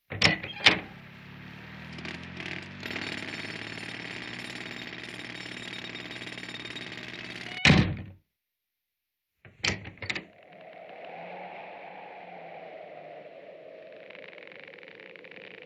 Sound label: Binaural Doors